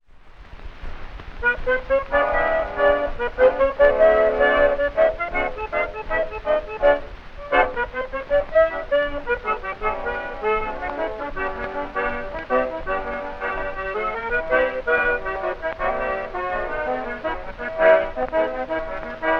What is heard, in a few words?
saxophone with orchestra; variety stage